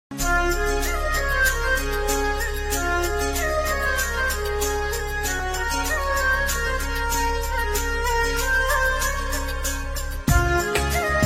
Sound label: bgm mobile ringtone